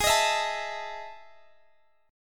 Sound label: E Major 11th chord